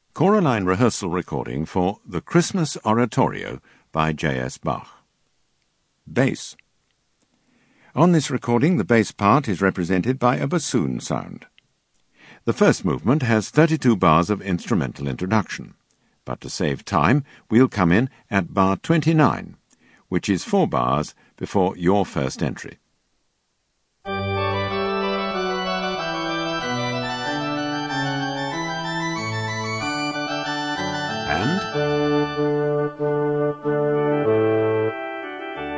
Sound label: Bass; Bach Xmas Orot